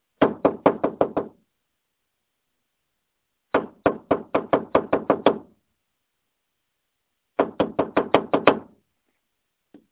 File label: Knocking Sound